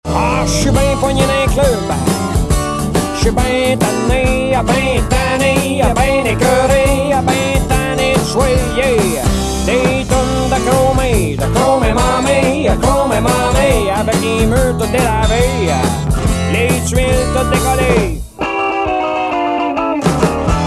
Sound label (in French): musicien heavy rate